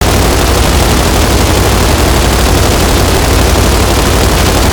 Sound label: lancerturret fireloop player; gow